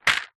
jewelry earings new on card several drop carpet

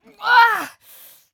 Female shout of pain; Screams Shouts 2; soundbits

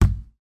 tyre fully inflated kick with boot; transportation; sound effects; sound effects one

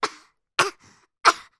human boy 3 years old british cough x3 weak

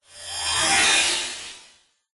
science fiction machine swell

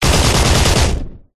warfare machine gun powerful burst designed

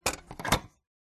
cash register till drawer note clamp lever lift; zapsplat

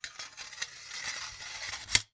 medieval sabre place in sheath slow; still north media

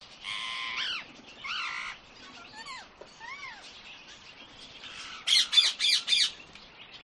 urban farm jumbo quail and background birds

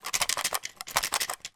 leisure game pick up sticks in box shake

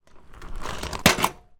industrial sack barrow stop set down; zapsplat

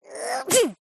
cartoon character cute high pitched sneeze

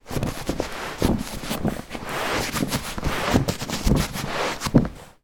household rug roll up

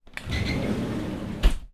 household shower cubical door slide

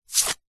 money credit card remove from wallet; foley; zapsplat

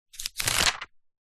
notepad page turn thin paper a4; zapsplat

